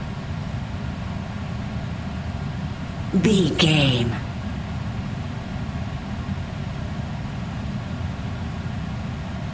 background air vent fan loop

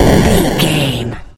retro explosion deep

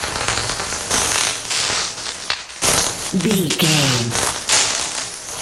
elec lightning magic arc loop

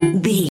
ui menu button cancel

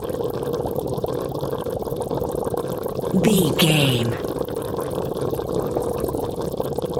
water bubbling loop short

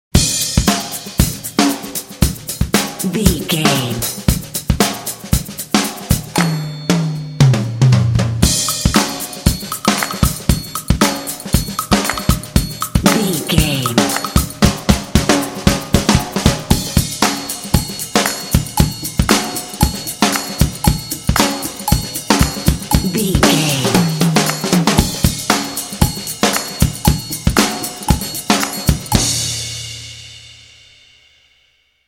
hip funky drums claps percussion

